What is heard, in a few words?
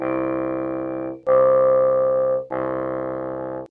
bassoon ee aa oo